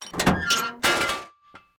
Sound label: Door Metal Sewer Grate Open; Doors